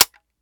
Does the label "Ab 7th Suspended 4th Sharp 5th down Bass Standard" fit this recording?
No